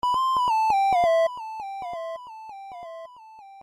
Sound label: Electro Synth Echo